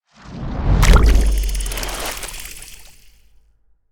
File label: Cinematic water transiton